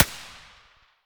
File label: aps muzzle far; STALKER; IWP